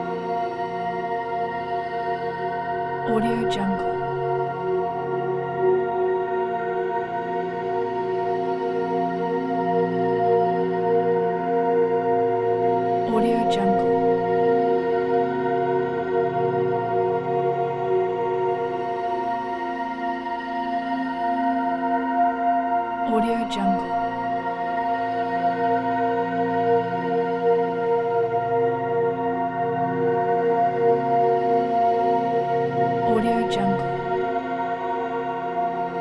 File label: heavenly presence eerie melodic tones mystical